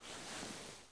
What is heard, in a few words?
body slide gritty, foley